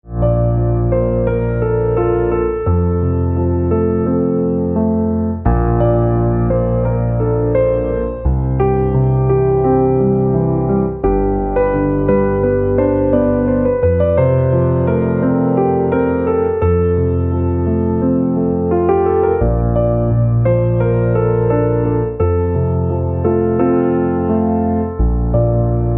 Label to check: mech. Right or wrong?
Wrong